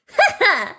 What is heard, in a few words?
haha; daisy; sm64coopdx; char select extra chars